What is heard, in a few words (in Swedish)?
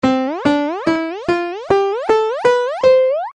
skala norsk